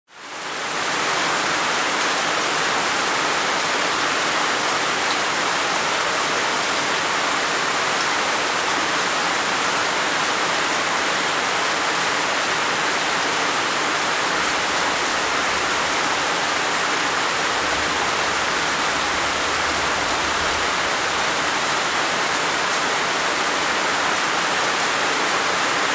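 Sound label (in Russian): приятные звуки дождя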